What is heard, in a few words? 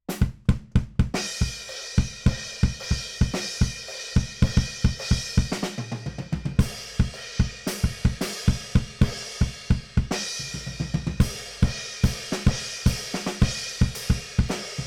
BD Out